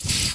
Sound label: Spray; StecMetronome